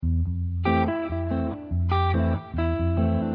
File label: latin jazz